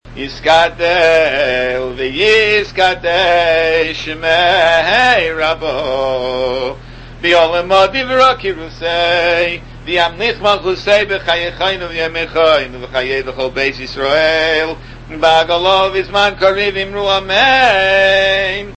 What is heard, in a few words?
Kaddish, nusach